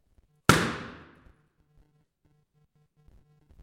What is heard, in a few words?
reverb Building; Harvey Mudd; Shanahan; Drinkward